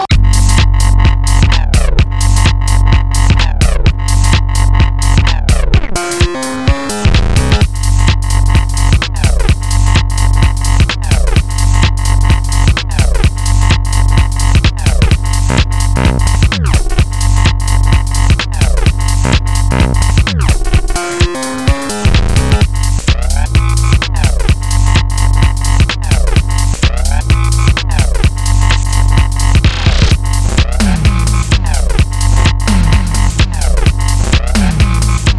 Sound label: the bass; the bass that caused the economic meltdown